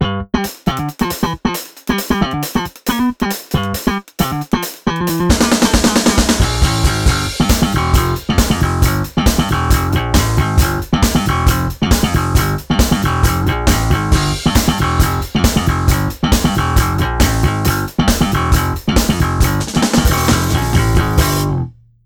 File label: slap ghost off drum on